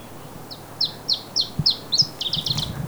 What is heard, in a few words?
ibe song